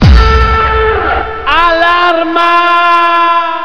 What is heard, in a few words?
bomba